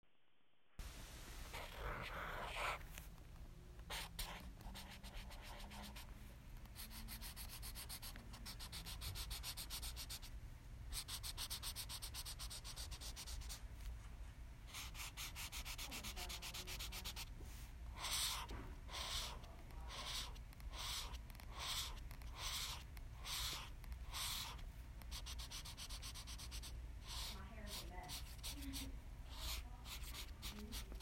Scratch

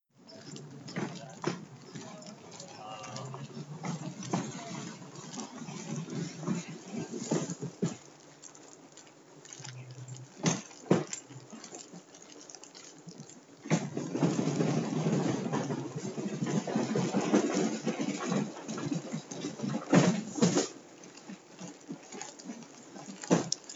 Cart

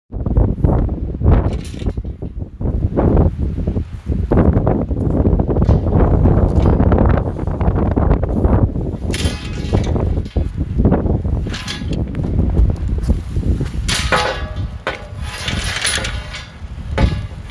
Rusty wheel and chain

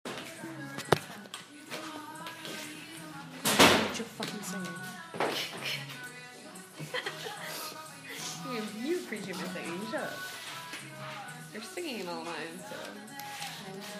Studio

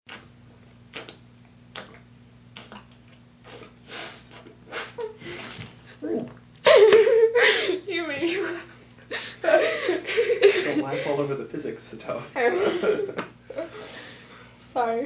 Drinking Water

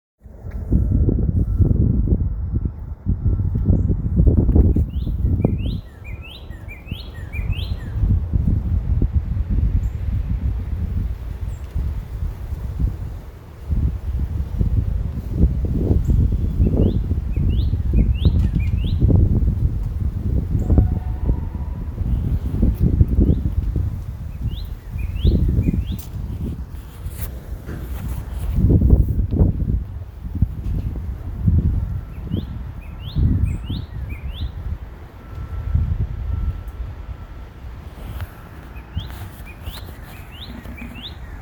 Bird in front of playhouse; This past week by a tree outside Lowe after class on a really nice day

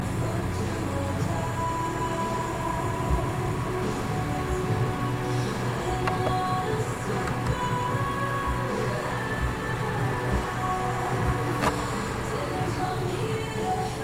CAFE

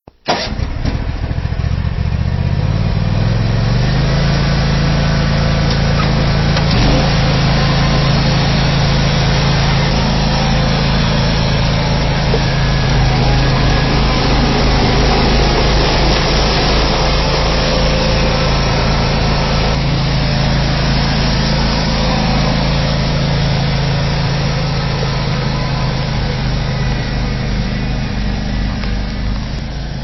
John Deere, snow; January 29, 2014, 11:20am, Unispan

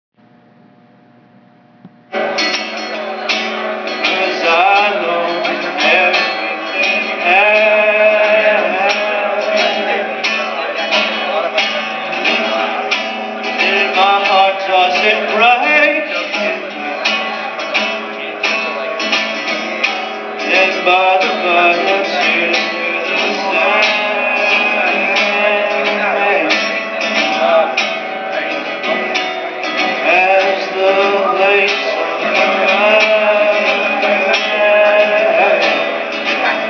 Coffee House Performance, singing

